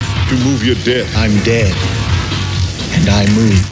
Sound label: CROW